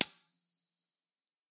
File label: oven timer ping; Dorothy And The Wizard Of Oz - Cookie Magic